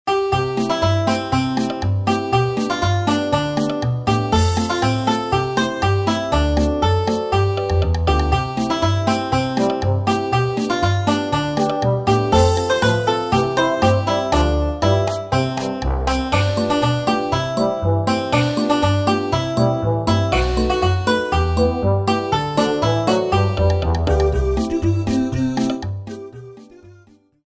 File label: pepe harmonica